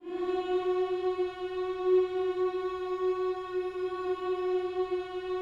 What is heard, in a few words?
HMMS F#4, GOSPEL HMM; Spectrasonics; Vocal Planet